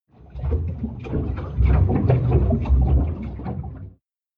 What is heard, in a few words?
water slo mo